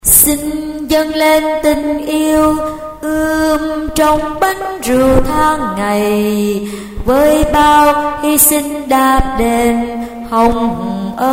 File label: basso